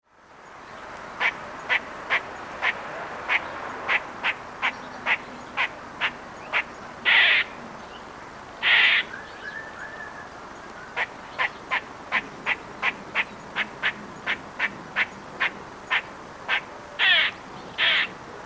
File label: squirrel